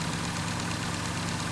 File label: slash idle, weapons; cstrike